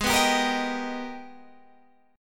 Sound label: G sharp Minor Major 9th, Guitar, Standard; strummed; JGuitar